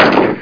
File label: shotgun; KillBill